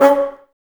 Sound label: Bassoons C#4; Miroslav Vitous - Woodwind Brass Ensemble; Bassoons Links